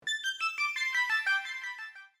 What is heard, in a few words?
transition synth; Hip Hop /R&B instrumental